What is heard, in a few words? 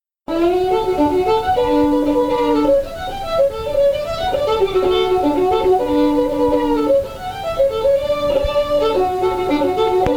reel fiddle; mulhaires reel; tune tutor; SeamusGrantFestival